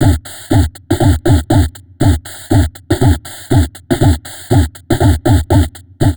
Drum Loops Crazy Processed; USB Soundscan vol.07; AKAI

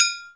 GLASS, perc; Zero-G; Funky Elements

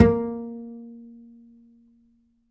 Upright Acoustic Bass, 1ST POSITION; Larry Seyer

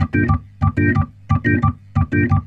Roots Reggae, B-3; Sounds Good - Roots Reggae

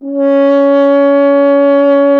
F. Horn; Miroslav Vitous; Solo Instruments VOL-2

